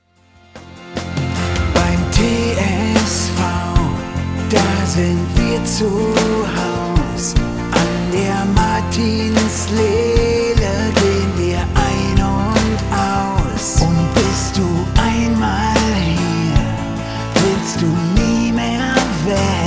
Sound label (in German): hymne klingelton; tsv